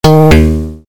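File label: Bass guitar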